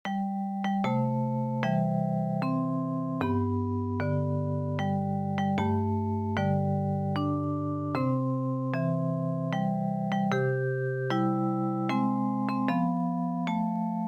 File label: happy birthday to you easy version